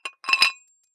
dumbbell thread take off weight quick, fitnessstudio